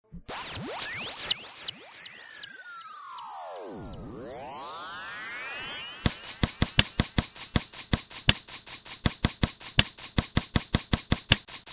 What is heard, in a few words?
Drum voice